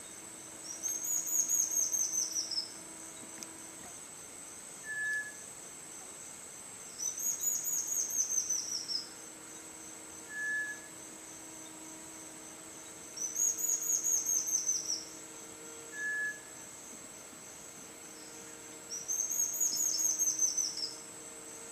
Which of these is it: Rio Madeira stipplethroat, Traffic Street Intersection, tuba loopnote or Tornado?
Rio Madeira stipplethroat